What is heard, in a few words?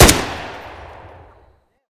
ak103 shoot